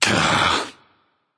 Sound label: "ugh"; smite; voicepack; poseidon